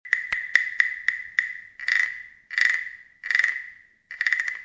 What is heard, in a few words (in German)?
Holzfisch